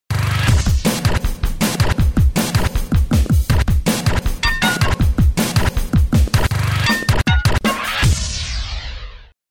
mini konk bed

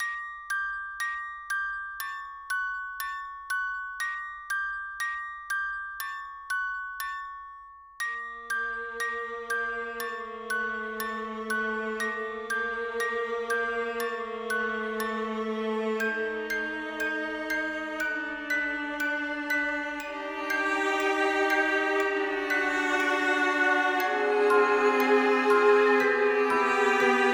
Sad, spooky violin, music box

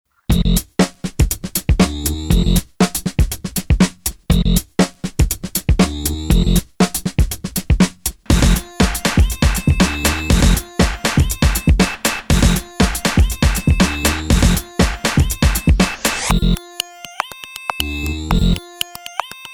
synth slap

